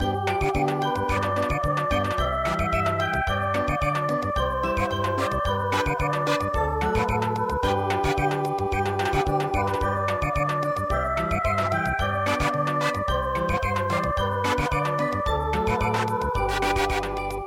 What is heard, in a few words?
Samba, traditional